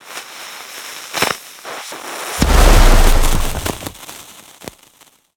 texture fire smoke grenade; mountain dweller; Bluezone Corporation; Steampunk

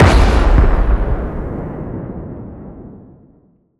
combat drone jet texture sonic boom; spaceship; Bluezone Corporation

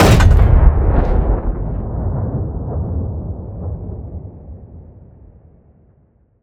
tank artillery cannon shot; video game; Bluezone Corporation

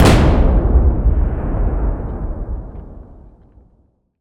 explosion outdoors large; Bluezone Corporation

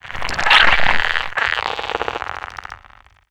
organic texture insect; Bluezone Corporation

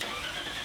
engine start; simpra

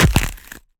creature crab organic texture attack impact; Bluezone Corporation

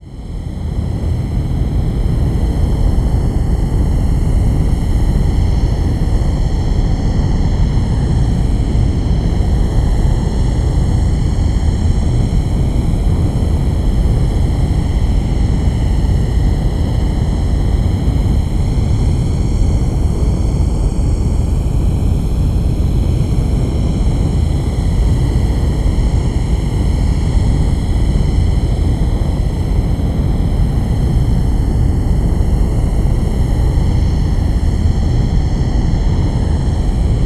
ambience, scary; Bluezone Corporation

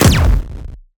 combat drone weapon scifi shot; Bluezone Corporation

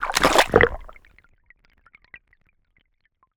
creature crab texture underwater; Bluezone Corporation

